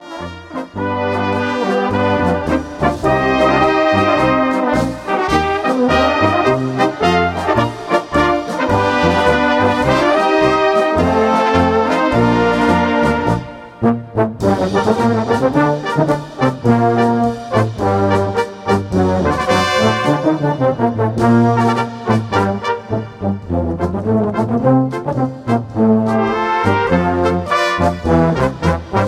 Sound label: Polka